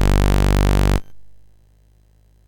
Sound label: sid MOS6581R2; California Games